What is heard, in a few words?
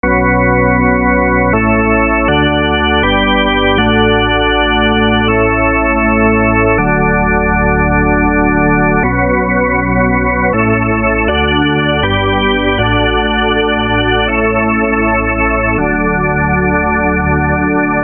organ